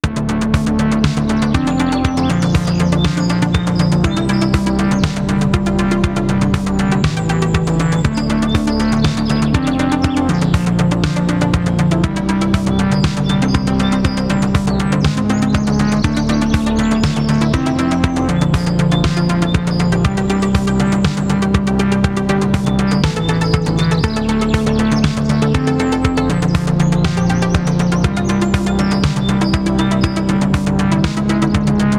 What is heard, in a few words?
Overworld Treetops Loop With amb; Rad Rodgers Radical Edition